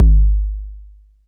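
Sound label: bass drum 808 Sat Click Decay C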